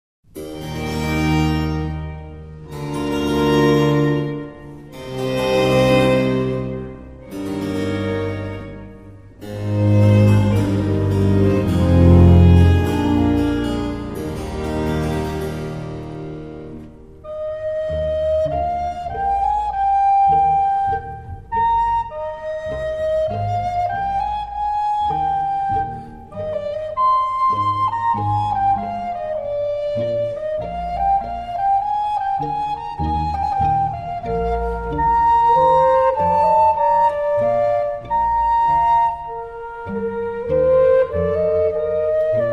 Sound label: Largo from Flute Concerto; Georg Philip Teleman